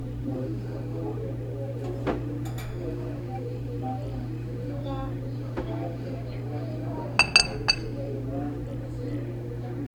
cafe ambience a quiet cafe

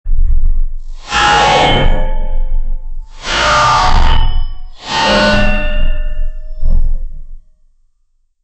mecha giant metallic robo

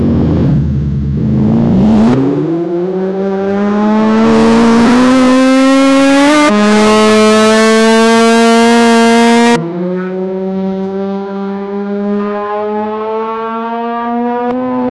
sounds of fast moving, vehicles

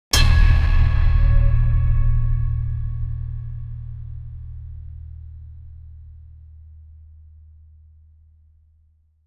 reggae bassline strum sta

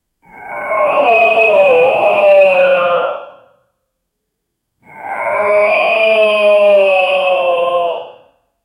an old orc male grunting; humanoid

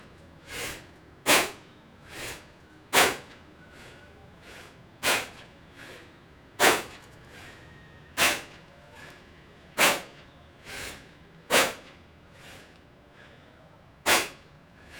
dash for front

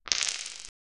a thunderous crack of bla; smoky

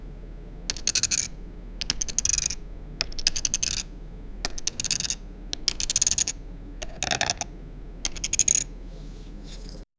chess piece movement soun, board game; sound effect

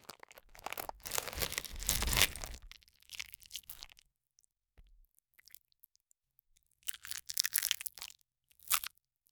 unwrapping of sandwich wi, crinkle

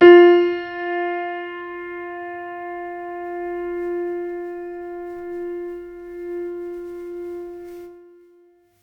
kawaii

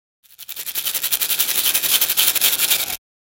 risers, ascending

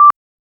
short soft sound, mistake

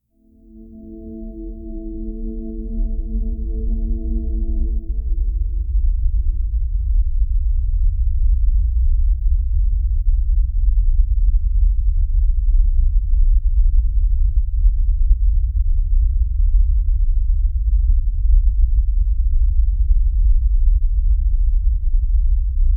cinematic documentary int

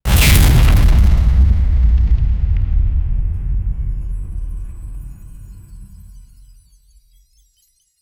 glitchy impact frames, portal

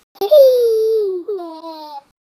cute a disappointed child

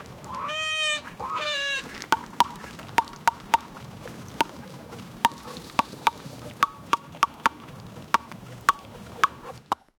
pig juggling tomatoes duc

